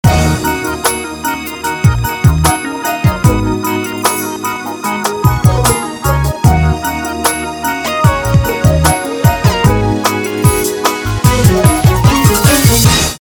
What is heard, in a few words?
groove